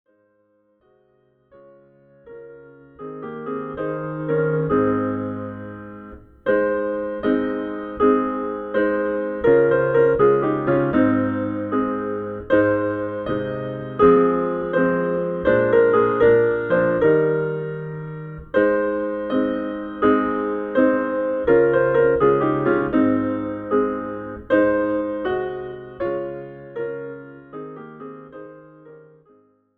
PIANO; gospel

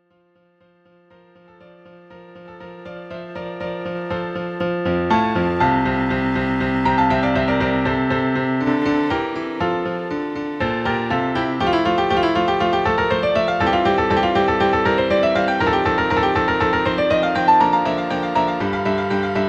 PIANO; MOZART

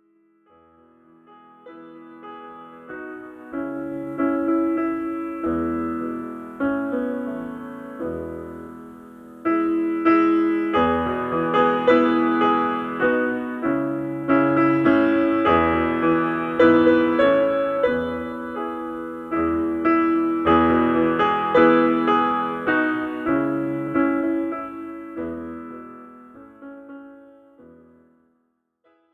PIANO, gospel

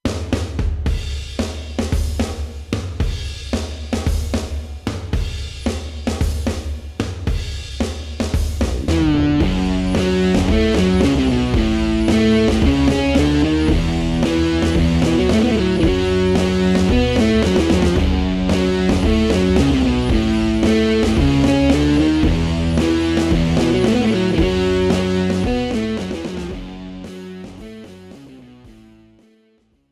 GROOVE

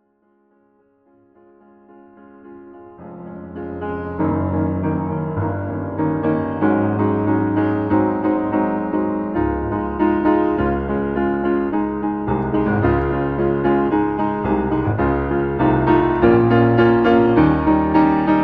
LOW VOICE; schumann piano

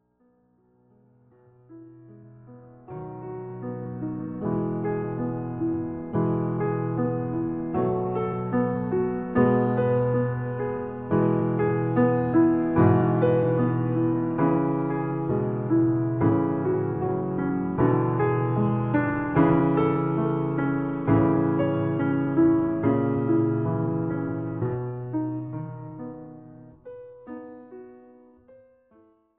MIDDLE VOICE; brahms piano

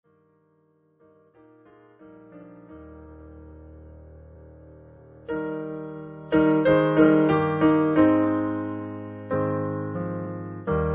PIANO; gospel

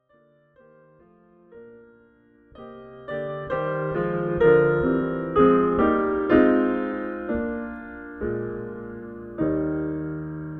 PIANO; gospel

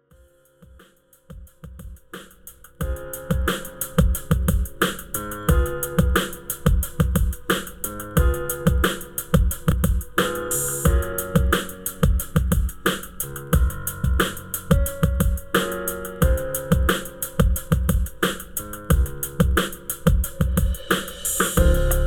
CHIMES

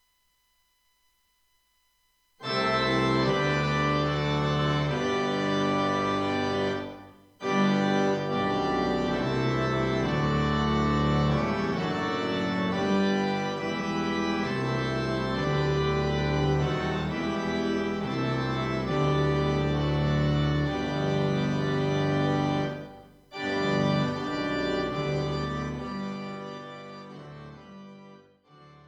ORGAN 1 VERSE, traditional

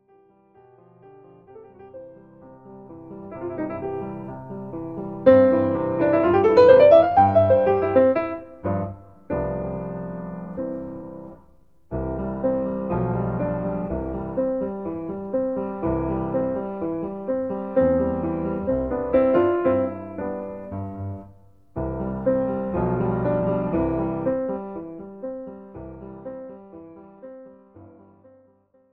LOW VOICE

